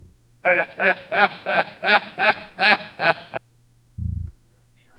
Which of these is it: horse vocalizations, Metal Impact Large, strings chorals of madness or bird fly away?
horse vocalizations